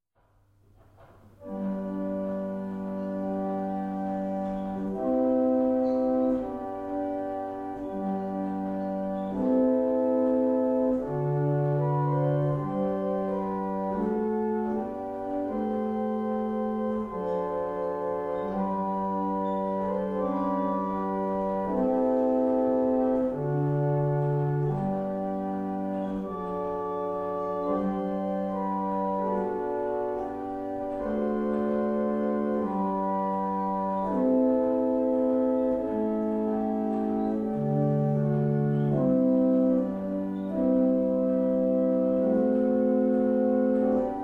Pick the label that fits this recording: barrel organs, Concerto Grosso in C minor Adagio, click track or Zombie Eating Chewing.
barrel organs